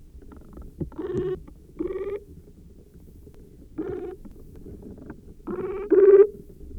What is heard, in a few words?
Platycotis, treehoppers; Cocroft